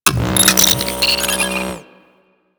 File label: Computer Activate; Objects